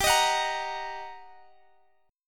G Minor Major 7th Sharp 5th G, Guitar, Drop D; chordshape; down

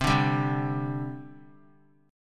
C Suspended 2nd, C, down, chord; Csus2 strummed

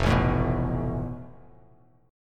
F Major Flat 5th, Bass Standard; FMb5 strummed; JGuitar

